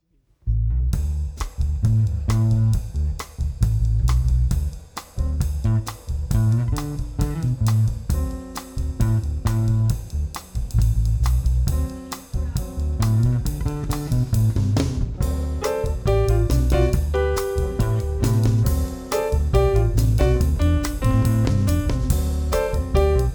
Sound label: piano